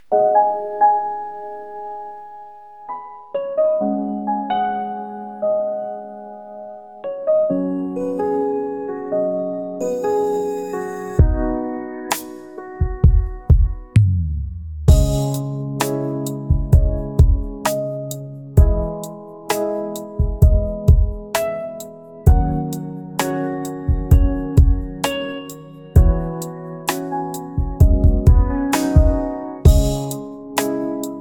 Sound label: piano calm; regen